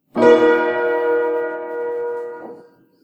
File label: piano